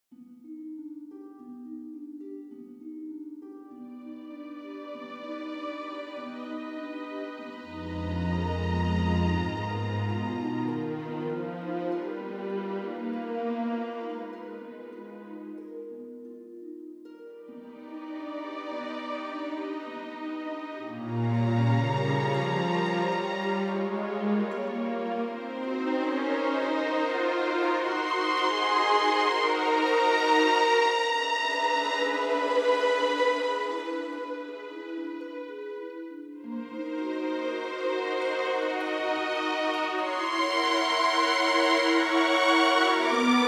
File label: Epic Orchestra